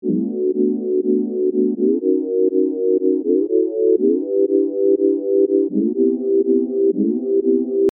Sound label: Pad; Communicate